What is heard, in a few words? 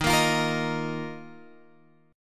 D sharp Suspended 2nd, Guitar, Open F; strummed; JGuitar